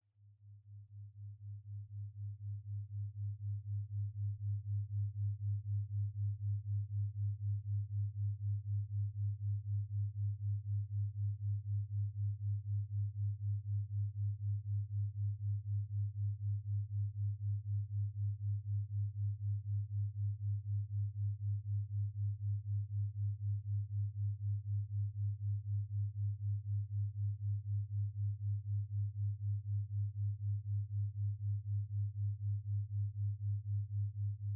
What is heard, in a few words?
Binaural Beats 4Hz C102Hz